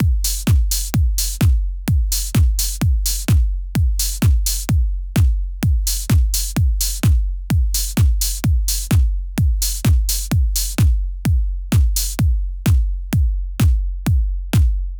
house beat in style; electronic

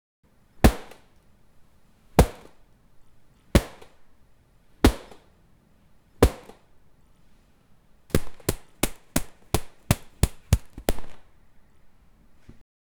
boxing

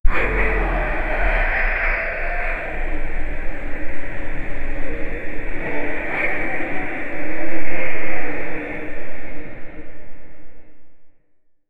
ancient magical artifact

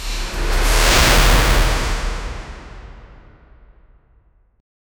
aqua spell impact

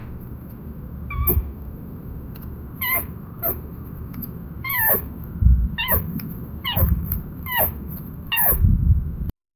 happy contended rat is pu, rodent

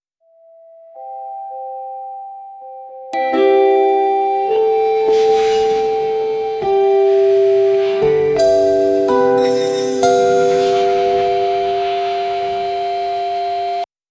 suspense revenge western

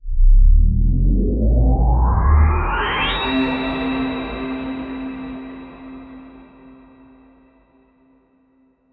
deep futuristic sci fi ga; game effects

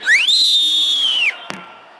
a quick whistle blow

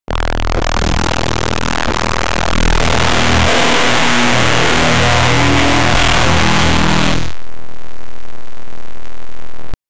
glitch buzzing error sound

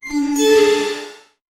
video game cyber fairy

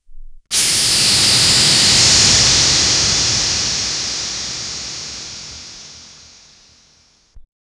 a cinematic film burn; airy

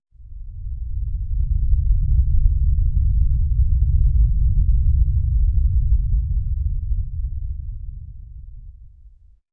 luxury ambient soundscape

